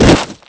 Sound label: body fall dirt; Spearhead Demo; MacFormat 2003 June